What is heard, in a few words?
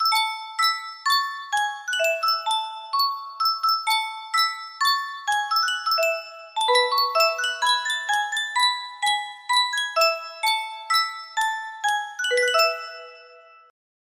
Yunsheng Music Box